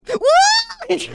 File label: woooh